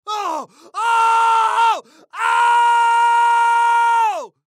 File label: Human Scream; Scream Multiple Long Painful Male Fifty Yrs; Human Scream Long Multiple Male Fifty Year Old; Screaming